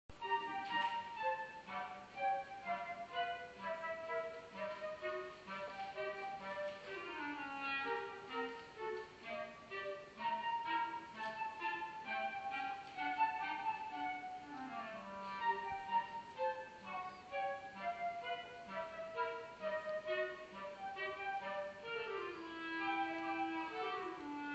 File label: dance of the sugarplum fairy recorded at a christmas concert i recently attended